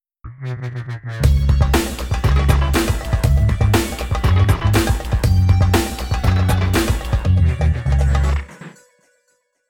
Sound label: jingle